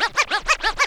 SCRAT, VNL FX; E-Lab; X Static Goldmine 2; akai

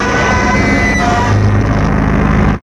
FEDBAK

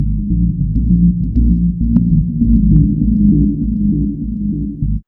B LOOP; E-Lab; X Static Goldmine 1

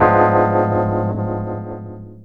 RHODES; E-Lab; X Static Goldmine 2

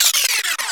SCRAT; E-Lab; X Static Goldmine 2; akai

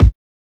BD; E-Lab; X Static Goldmine 1

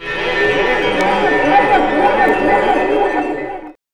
ECHOES

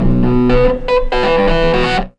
RHODES

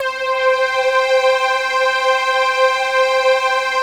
PAD, ATMO PADS; Best Service; XX Large

